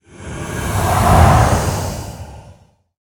Lightspawn Enter Play

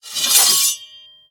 Glaive Large Underlay Attack

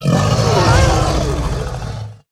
Angry Hellhound Death

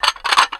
Plate Underlay Play

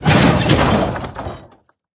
Meat Wagon Attack

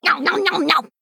Female Plate Attack

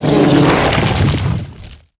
Faceless Destroyer Death

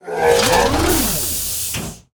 Scrap Golem Play

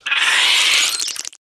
Venomous Scorpid Death

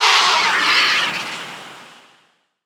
Diseased Vulture Attack

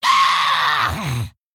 Death

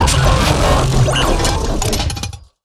Toybound Giant, Death

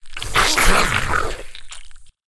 Ravenous Cave Crab Play

